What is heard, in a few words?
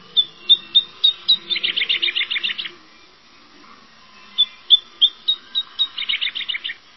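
bird singing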